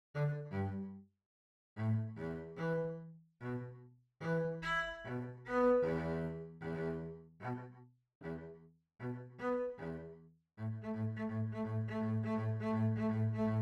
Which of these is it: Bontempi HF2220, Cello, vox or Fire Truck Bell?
Cello